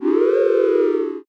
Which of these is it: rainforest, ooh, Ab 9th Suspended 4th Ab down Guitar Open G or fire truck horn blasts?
ooh